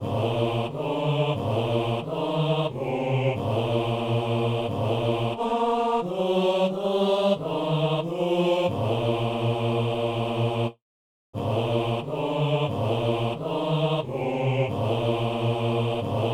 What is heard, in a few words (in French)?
basse; Prières universelles